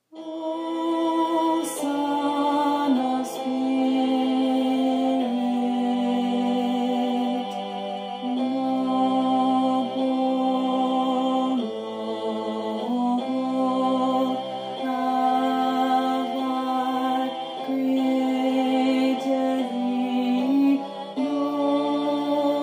alto

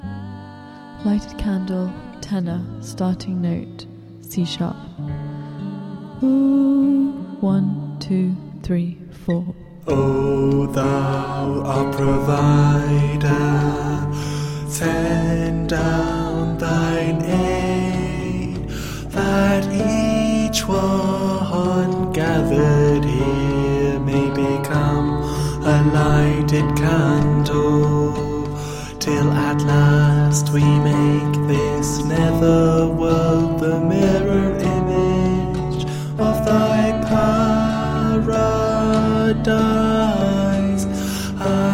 tenor, choral music

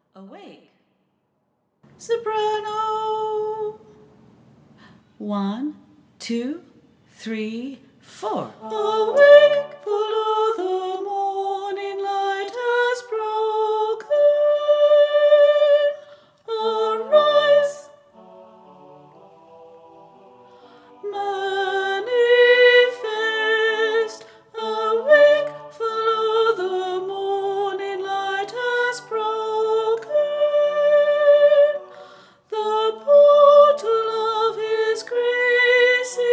soprano

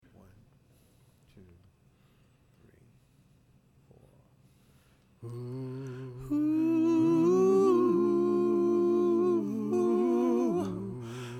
soprano; if i go not away

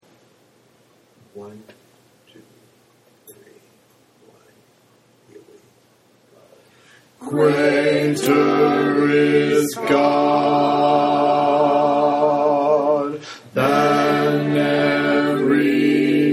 bass

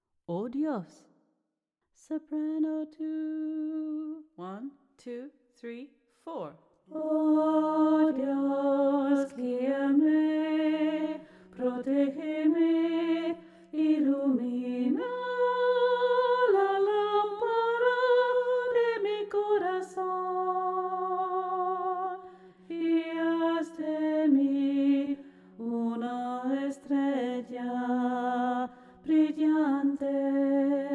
soprano, choral music

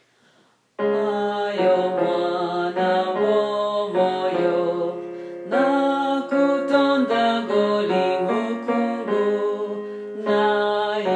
tenor

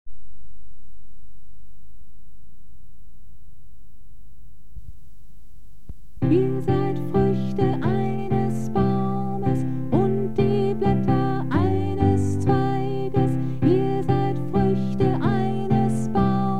soprano, choral music

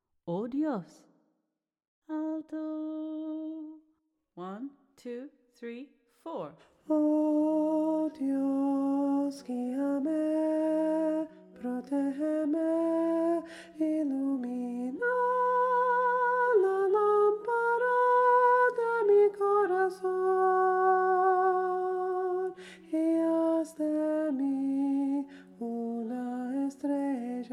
alto, choral music

